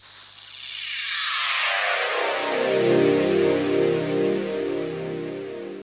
Swoop Eb, Pads; Zero-G; Total Drum Bass

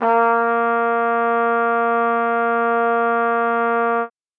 trumpet a#3, Trumpet piano; Session Instruments

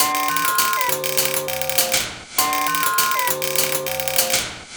Ugly, Industrial, Nasty

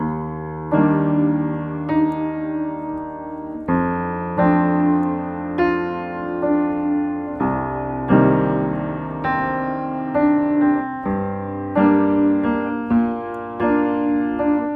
Piano Chords

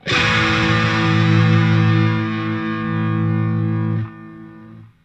Funk Guitar; 90 s Sample CDs; Zero G

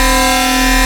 Aud Edge C4, Xtreme Lead; Multisamples; Digital Sound Factory Xtreme Lead

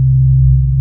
C2, Synth Line; 90 s Sample CDs; NiceBeats; SynthLine VOL-2

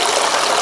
Brook

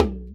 Talking Drum Hit; Sonic Foundry (Sony Creative Software); Joe Vitale Latin Percussion

